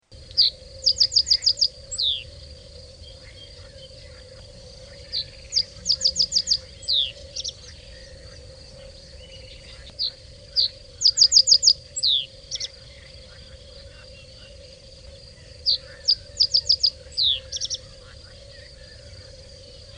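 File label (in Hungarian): Nádi sármány, Madarak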